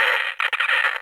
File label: TOY XPLOD, TOYS